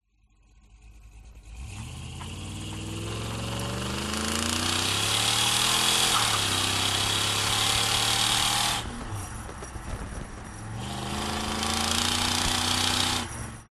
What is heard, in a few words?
Vintage Car Chevrolet 57 Racing Start Double Exhaust Bright; TRANSPORTATION